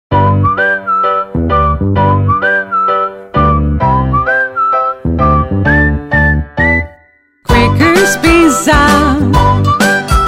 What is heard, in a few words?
jingle; crackers pizza